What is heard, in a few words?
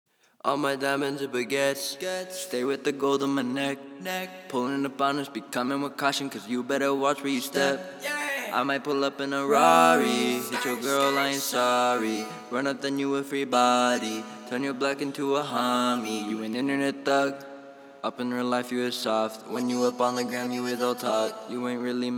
120BPM Key F